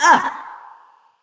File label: Wound Medium, Creature; Eydis